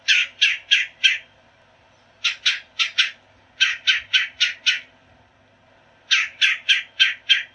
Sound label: Red bellied Woodpecker